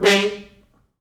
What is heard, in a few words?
Horns stops